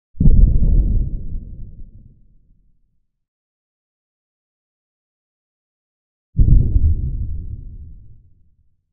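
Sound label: underwater explosions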